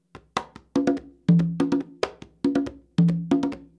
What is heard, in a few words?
fast rumba, congas